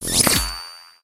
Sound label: powerup; Source Engine; Portal 2